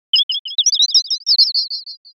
chirp mix

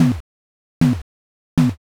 hammer

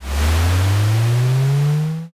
rocket launch

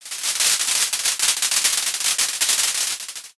ovation

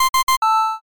news title

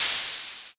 spray

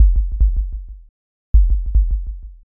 heartbeat

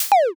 delete

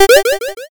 picoyes

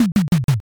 drum fill

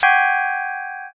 sting

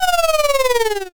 horse neigh

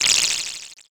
text anim

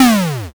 explosion m

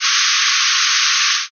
faucet

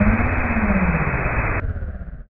monster death boss

